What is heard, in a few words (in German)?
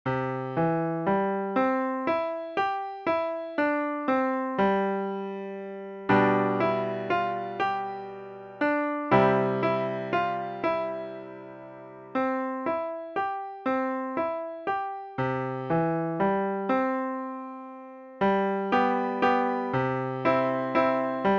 Harfenklang